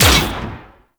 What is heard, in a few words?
Downmix Shot; Protea Prime Boss; Warframes